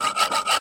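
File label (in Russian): распил старой пилой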